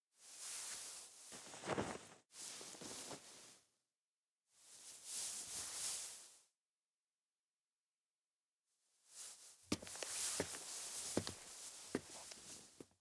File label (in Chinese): 胖子拖人